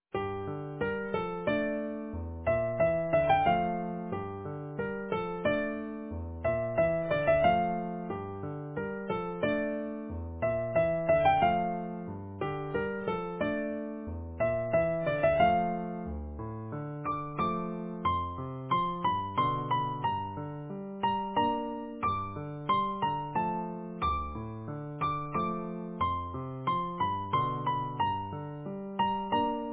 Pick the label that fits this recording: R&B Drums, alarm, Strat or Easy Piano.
Easy Piano